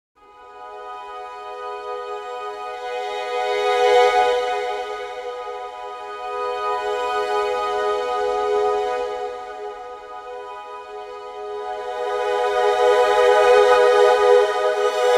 Con Sordino Strings 3 Articulations Dynamics Expression wet; Orchestral Tools Berlin